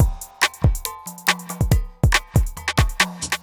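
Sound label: industrial 140 kit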